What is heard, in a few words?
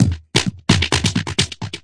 flattened clap echo beat